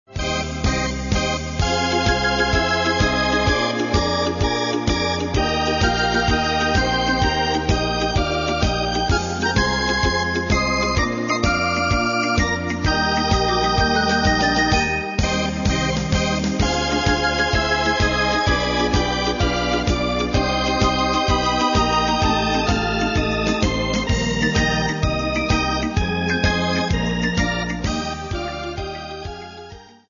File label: Tarantella Toscana